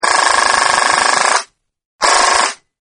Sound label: Airsoft MP5 Burst, Airsoft Mp5 Gun Dry Burst Fire; Airsoft Mp5 Gun Dry Burst Fire-2 Takes, Long Burst, Short Burst, Toy, Submachine Gun, Weapon; Toy Gun Sound Effects